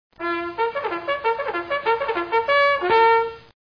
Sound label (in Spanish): trompeta